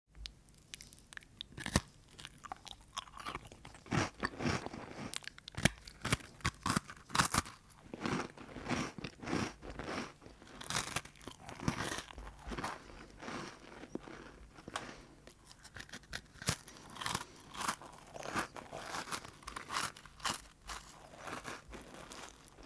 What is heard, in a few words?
eating biscuits